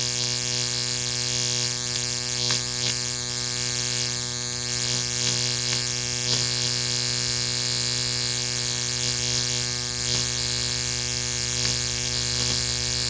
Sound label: Electricity Hum; zps; Ambient